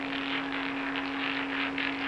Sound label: Ball Moving Loop; Twingo